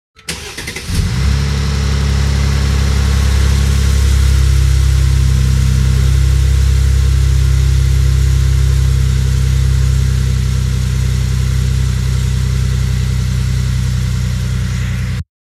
startup rattle r231; sl-class

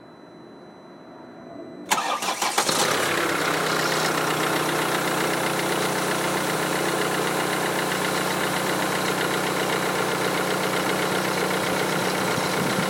e250 om651 cold start passenger side start up; e-class w212

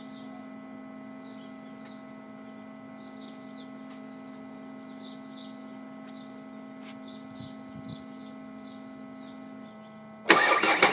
e250 om651 cold start; e-class w212

